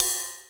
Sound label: Ride, Korg KR-Mini, Drum Machines; 300 Drum Machines